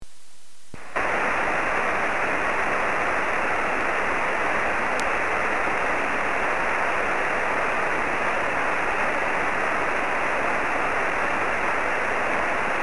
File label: 23cm no qso